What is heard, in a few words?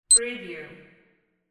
timer button beep